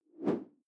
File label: whoosh simple short